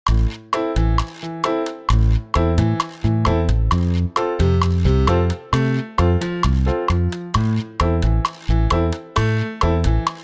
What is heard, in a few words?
Cool Cha Cha Cha